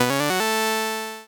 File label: jingle